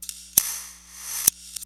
SNIPER STOP; Rubber Chicken Software Sample Bank

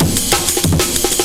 amen chop; amen particles

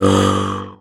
MT G, JHARP G B; Xsample VOL-9

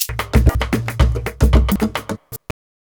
SHAKER, Modern Percussion Loops

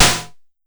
Snare, Pollard Syndrum

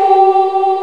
FEMAL CHR, SYNTH VOX; East West - Global Sounds

